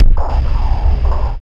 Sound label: FX SWEEP